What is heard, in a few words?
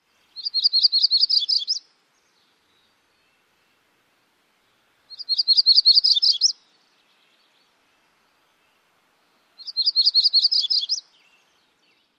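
songs, bird; CA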